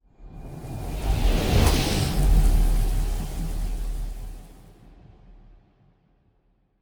ship colony ship move; Stellaris; machineage

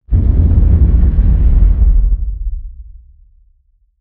distance titan laser fire; weapons; Stellaris

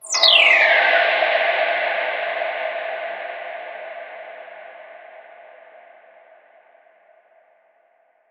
falling star; Stellaris

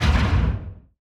distance plasma fire, weapons; Stellaris

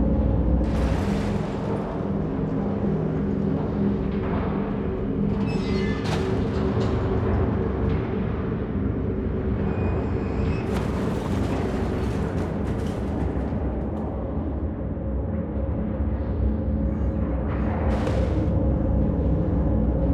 fc ship preftl slaver broken idle; Stellaris; first contact; ships

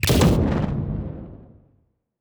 titan muzzle energy explosion, weapons; kaiju; Stellaris; biogenesis

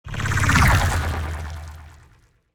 ship strikecraft move; biogenesis

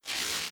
reality breach spark; Stellaris; nemesis

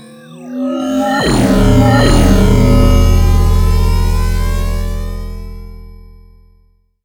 assimilate hit; apocalypse

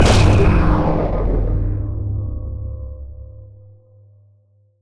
scavenger bot attack main weapon muzzle; Stellaris; distant stars

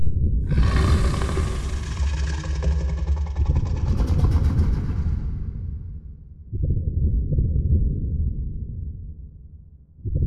aquatics dragon idle

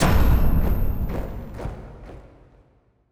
relic gavel; Stellaris; grand archive; relics

